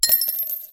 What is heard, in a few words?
coin falls